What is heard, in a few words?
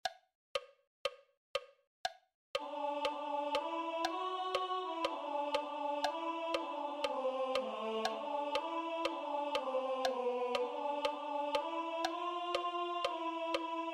A tender shoot Tenor; Otto Goldschmidt